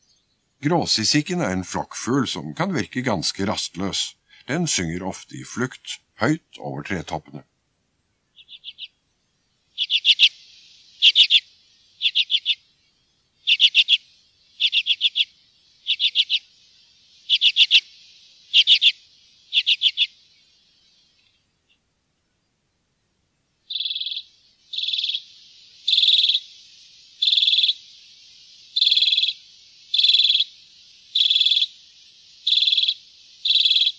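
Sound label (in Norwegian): gråsisik, fuglelyder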